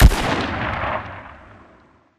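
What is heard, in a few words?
close attack tail; Titanfall 2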